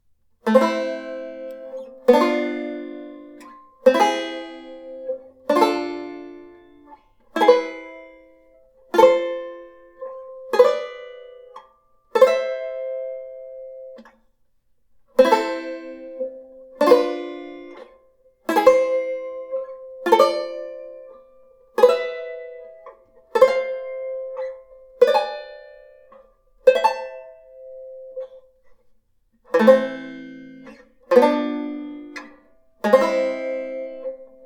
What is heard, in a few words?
Minor Triad Scales; Beyond Chord Melody Volume II